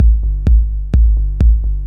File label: Rhythm Electro Drum Machine House Minimal - Gm - 128; drum loops